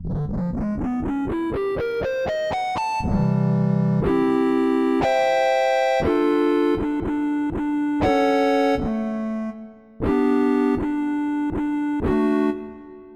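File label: Guitar Harmonics; EverQuest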